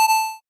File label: sonar